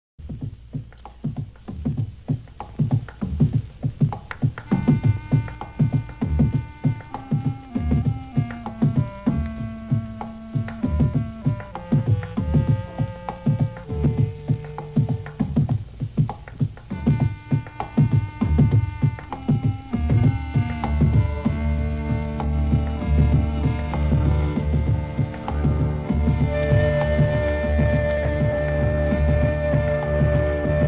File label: Instrumental Round